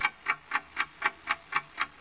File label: ticking; beeps